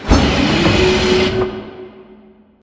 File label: Assembly Plate Lift Up Metal Lift; Boomtown; Titanfall 2